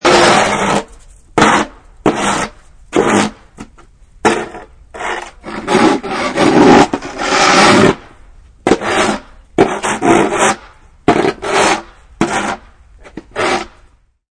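Scraping Snow Sidewalk, Loud intense scrapping of shovel on icey sidewalk.; Scrapping Ice Off Sidewalk; Yard Tools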